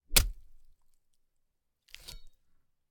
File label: gore, large metal blade wet stab slash and remove from flesh, hard; Timothy McHugh; Gorification [HD]